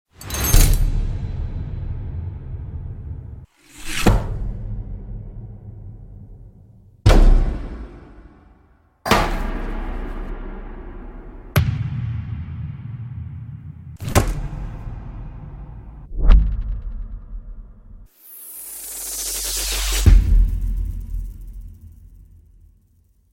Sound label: Short impact; کوتاه ضربه ای